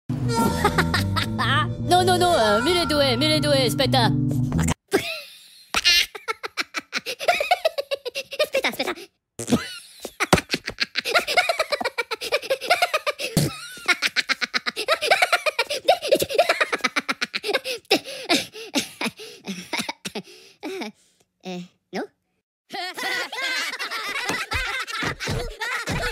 Funny Laugh Ringtone; Minions

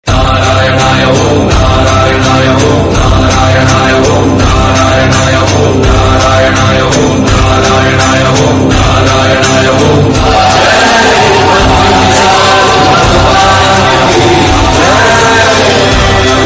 Bhakti Ringtone

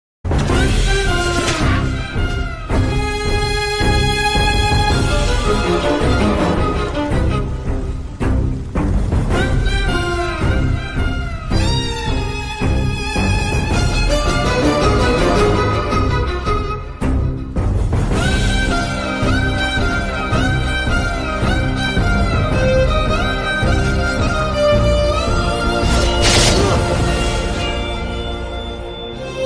Violin Music Ringtone; Bahubali 2

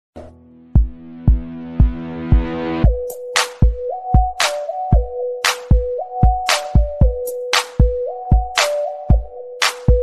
iPhone 11 Pro Ringtone; ringtones

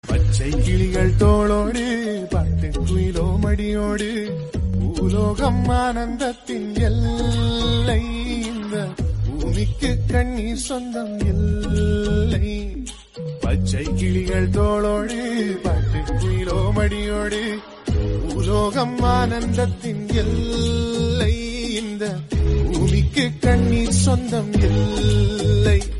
Song Ringtone

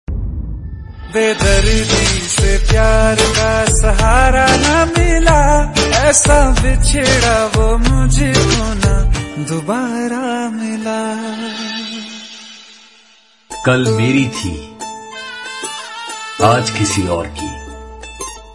New Hindi Sad Ringtone

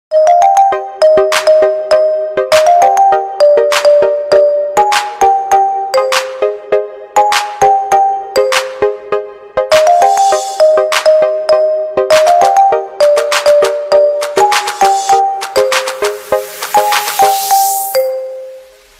iPhone X Ringtone

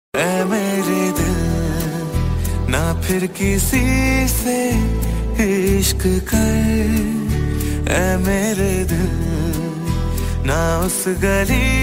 Sad Breakup Ringtone

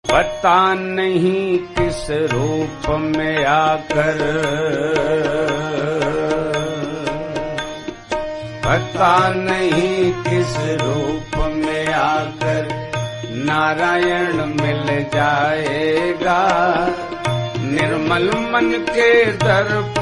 Hindi Ringtone

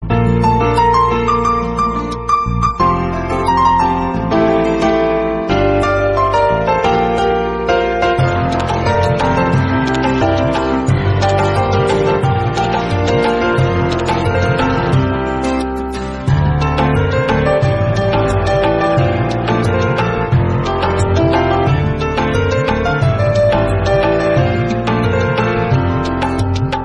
Instrumental Ringtone

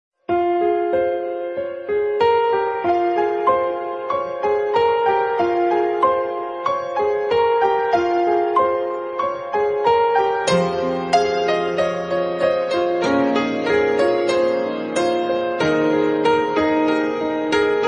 Instrumental Ringtone

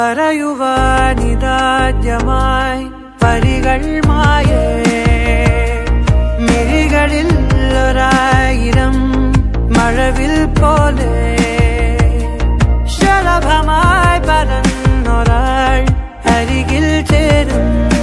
Movie Song Ringtone

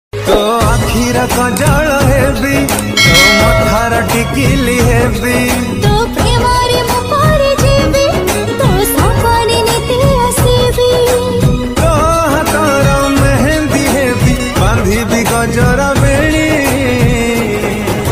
Odia Ringtone

